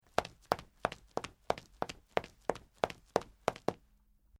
footsteps man; human

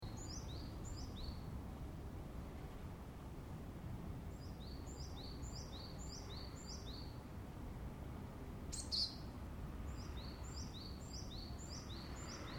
juutaku yokohama, ambience

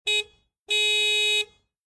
car horn

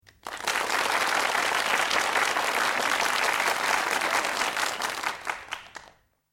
clapping

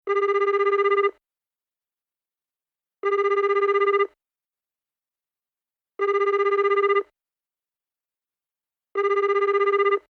telephone

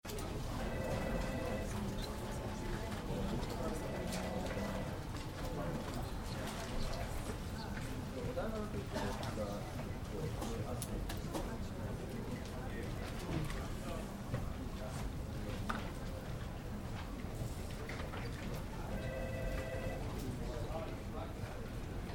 office, ambience

